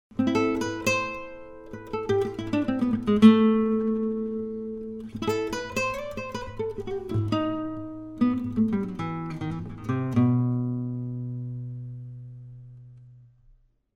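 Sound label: Acoustic Guitar Test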